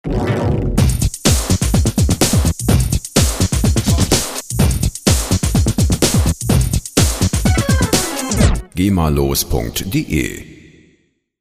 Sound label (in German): dance tusch